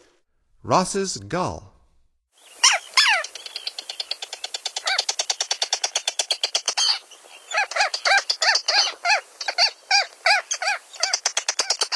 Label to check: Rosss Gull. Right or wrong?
Right